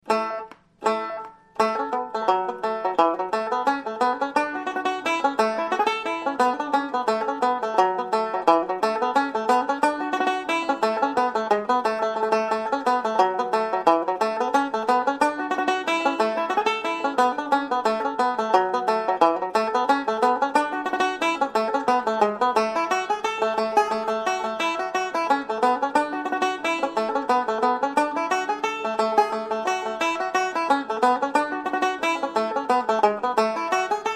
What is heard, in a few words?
banjo, reel